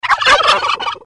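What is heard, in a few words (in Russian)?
индейка короткий шумный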